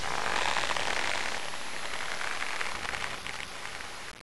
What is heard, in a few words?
rope